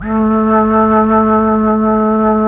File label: FLUT; ILIO; Propeller Island Legendary M400